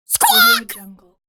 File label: cartoon comical bird squawk